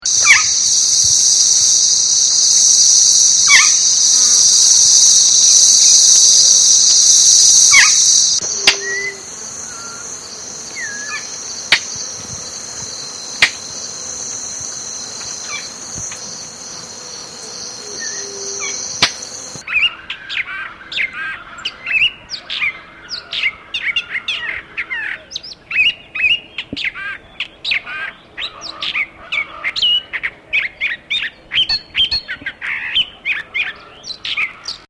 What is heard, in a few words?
long billed thrasher